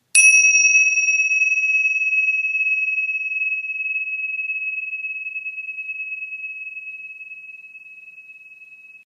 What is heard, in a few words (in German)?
Meditationszimbel